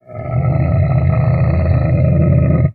Camel Groan Single; camels

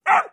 Dogs Medium Size Dog

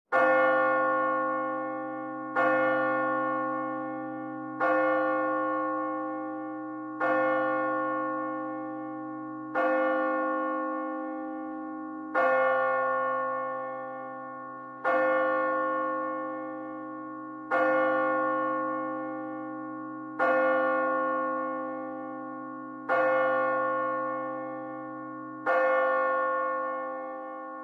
Bell Tower Medium Per; bell towers

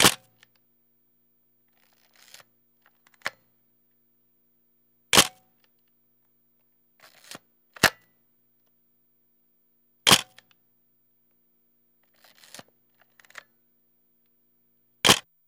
35mm Camera Click; shutters & movement

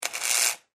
Arc Welder; arcs & sparks

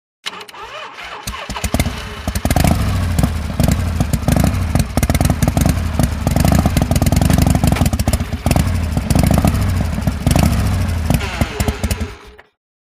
Start Idle Stall Harley, Harley Davidson

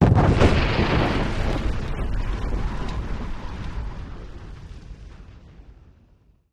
Explosion Rock Debr, rock & sand; Rock Debris 02

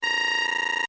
Computer Working, beeps & tones

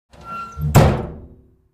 Cabinet Door Slam

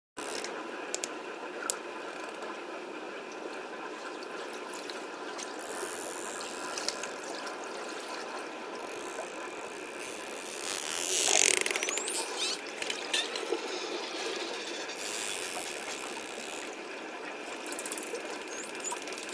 Dolphin Bottlenose

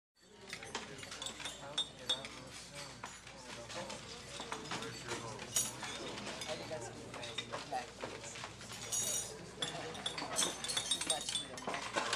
Restaurant Small Bsy

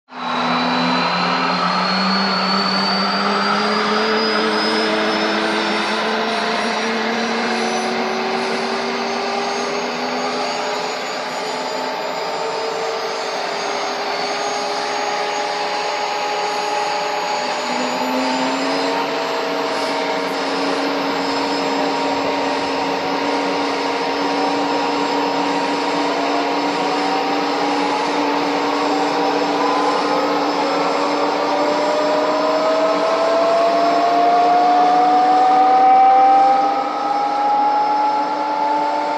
Gazelle Idl Lng Stdy I, aerospatiale

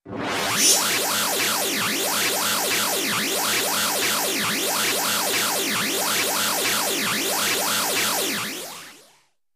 Oscillate Tones, zaps

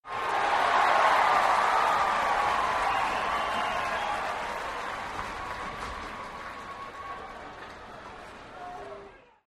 Crowd Hockey Cheering; sports crowd hockey

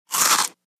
Dry Cereal Dry Crunch

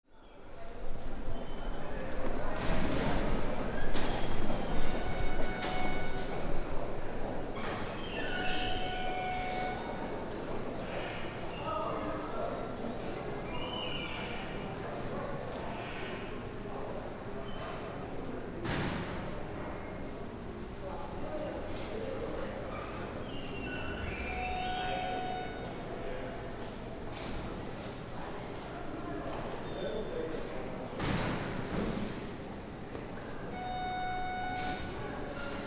Building Hall Amb Rev, lobbys

